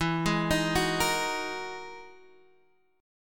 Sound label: E-Major Sharp 11th E, Guitar Half Step Down; EM#11